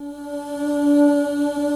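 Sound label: Fairlight vox; Greytsounds; F2-SYNCLAV 2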